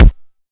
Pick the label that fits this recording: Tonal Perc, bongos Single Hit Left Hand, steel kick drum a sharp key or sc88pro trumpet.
steel kick drum a sharp key